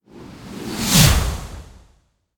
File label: whoosh rocks; Sky Diving